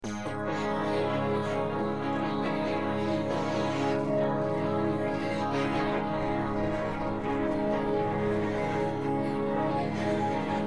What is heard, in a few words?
no vocals